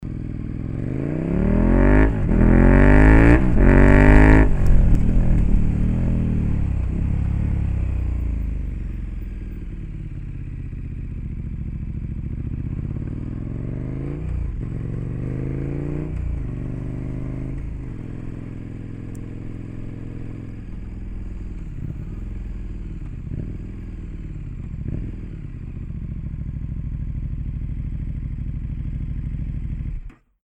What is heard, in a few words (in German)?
KTM 1290 Super Duke R NXT ohne DBK; REMUS